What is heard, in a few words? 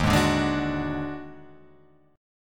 Eb Minor Major 7th Double Flat 5th; JGuitar